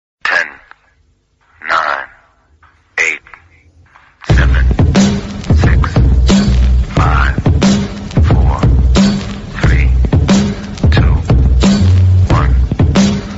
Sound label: music happy birthday tik tok